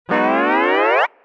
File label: stretch rubber, smeshnye mulitiashnye zvuki